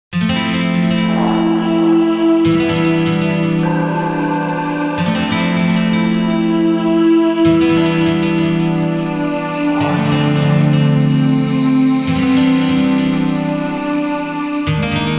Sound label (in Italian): musica elettronica; electronic